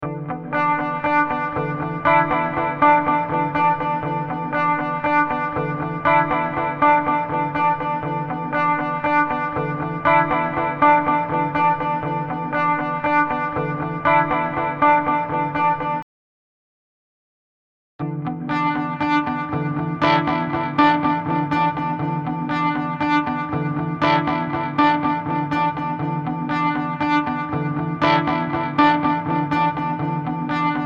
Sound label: Edgy Tube